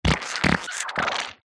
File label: cartoon squelch